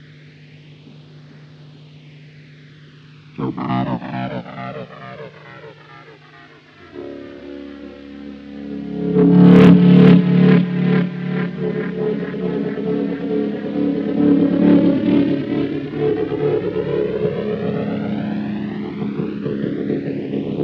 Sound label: didger